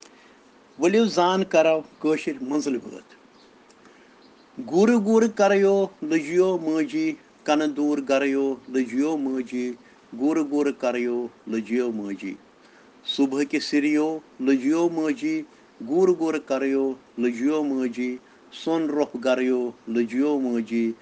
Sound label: Children Rhyms in Kashmiri